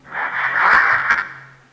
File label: noise